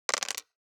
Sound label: DICE on Plastic, Throw and Roll, Standard, 2 Two Dice; Samples; ArticulatedSounds; DICE Sfx Party (Extended)